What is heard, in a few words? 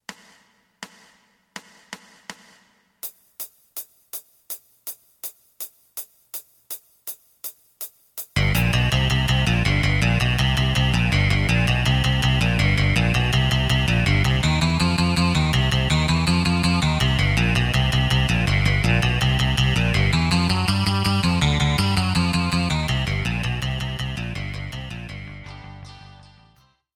drum